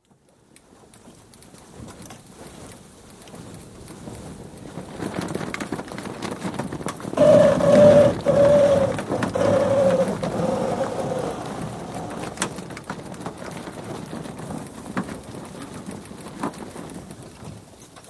Sailing Dinghy, running aground (Recorded on board); Boat Sound Effects; BBC